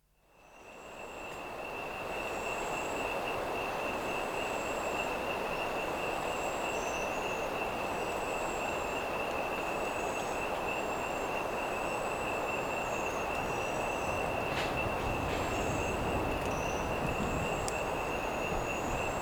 Storm Approaching a Marsh (With Frogs and Crickets); Dr. Sound Effects; 210 Nature Sounds - 20 Hours of Relaxing Natural Ambiences for Meditation and Sleep